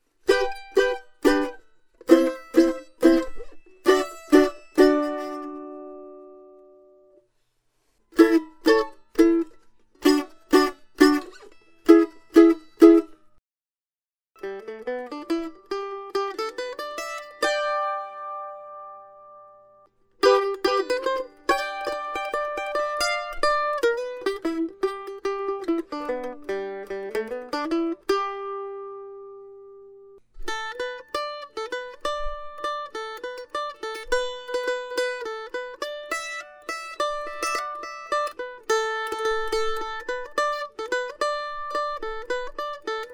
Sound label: Mando